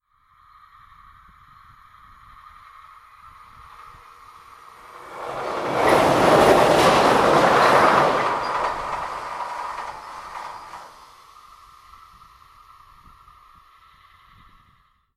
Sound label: short train in passing low; salamisound